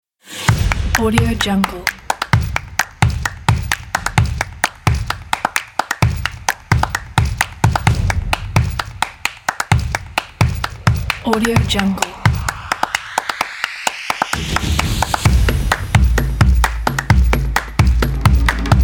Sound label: claps and percussion; scorpioon